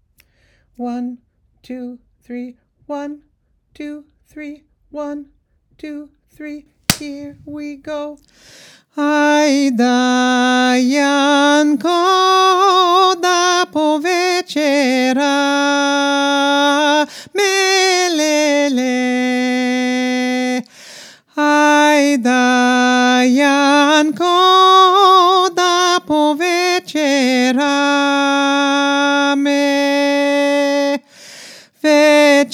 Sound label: Melody 1st Verse